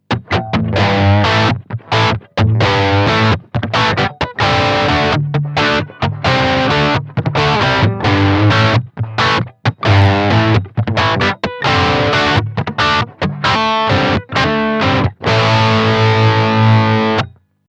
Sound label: Clean Gain